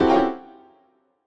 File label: Piano Short Phrases; Piano Nudes